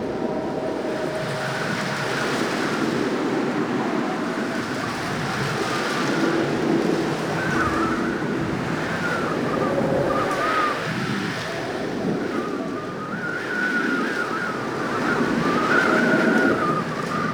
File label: Settlement Open Tunnel Exterior Ambience; Warframe; Grineer Settlement